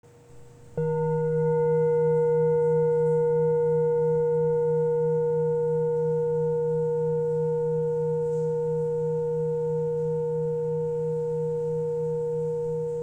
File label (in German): Mond